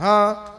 huh, Voice

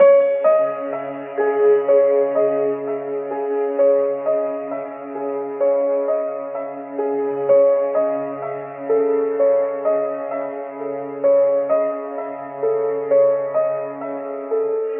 piano, sad loop

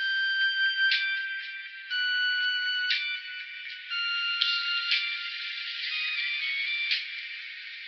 nearly a whine, Electronic Loops

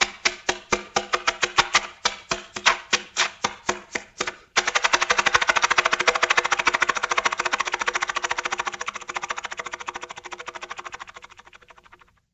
toon car sputters

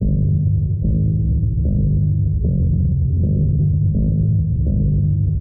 bass, Loops

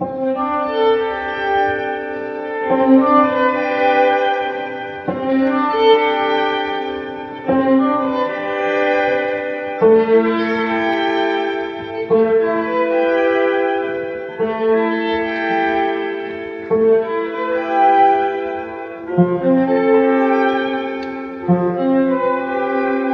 strings room noises, Sad; Loops

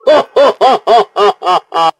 laugh maniacal 8 bit man; ooh ahh

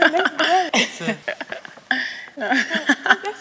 laugh girls; ooh ahh

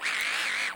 noisy, zaps and beeps

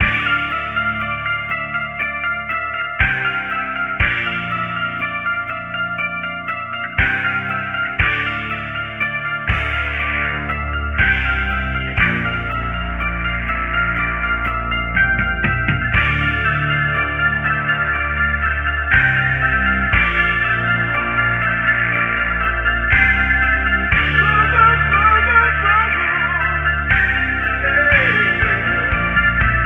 eighties ballad, Electronic Loops

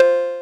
unawares, Cowbell Electro, Misc Percussion

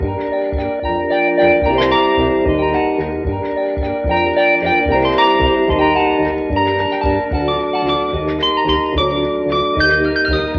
keys, phonky loops

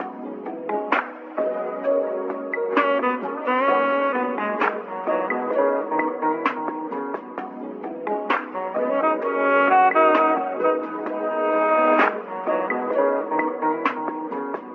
sax; Phonky

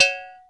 bung, Bells; Misc Percussion

